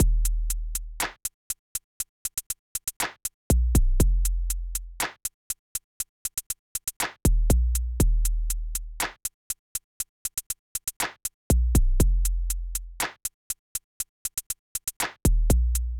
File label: 808 bass loop Drums and 808